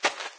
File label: foot walk; There; ThereClient